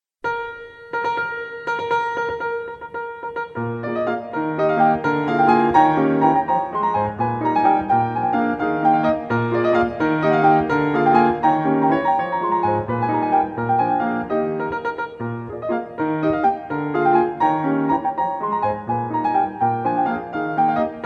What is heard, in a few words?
Grand Valse brilliante Op 18 in E flat major; Chopin; piano